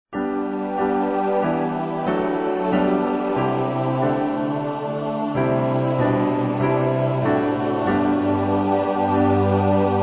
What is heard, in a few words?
score sample; Voices from the High School